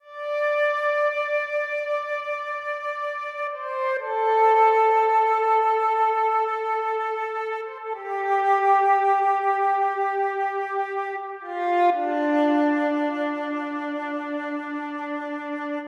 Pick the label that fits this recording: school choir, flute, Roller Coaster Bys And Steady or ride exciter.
flute